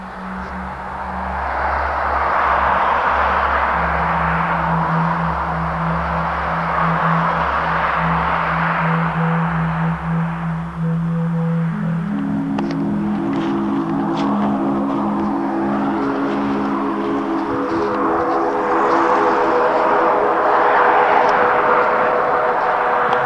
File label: sinus